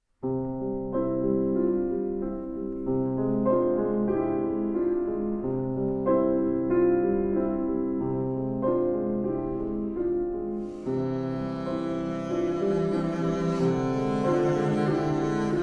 Double Bass & Piano; Ave Maria

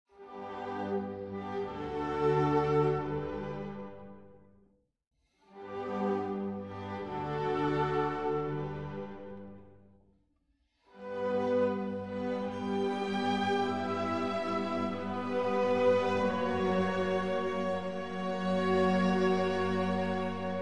Violin Concerto, D Major, Larghetto

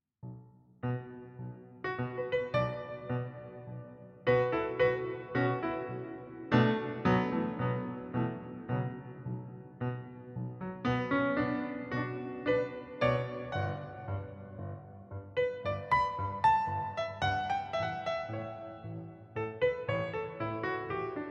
Jazz - Gentle Piano

